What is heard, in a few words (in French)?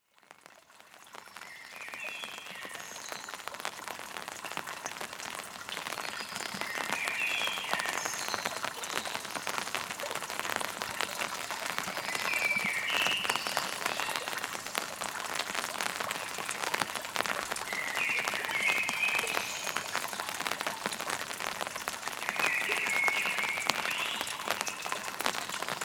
pluie metropole